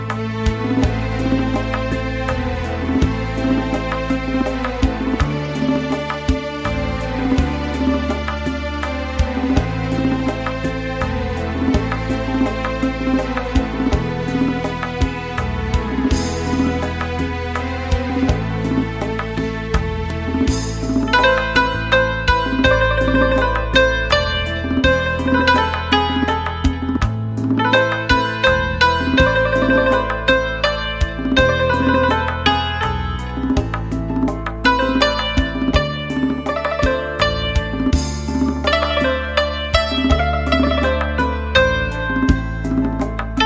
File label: Santur Saxophone